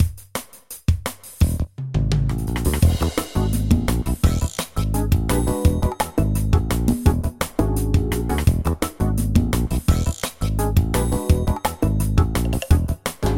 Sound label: music menu